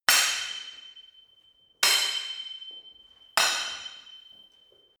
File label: sword hit sword sequence